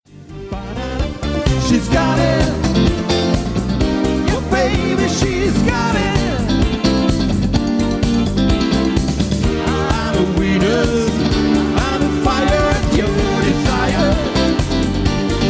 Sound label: disco medley